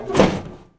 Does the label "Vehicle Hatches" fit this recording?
Yes